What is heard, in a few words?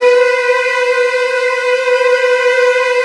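Virtuoso Strings, violin trills, B2; ILIO; Kirk Hunter Virtuoso Strings VOL-1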